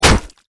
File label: hit arg